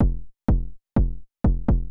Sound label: kick, house, 125, drum; eJay